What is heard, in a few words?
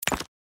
click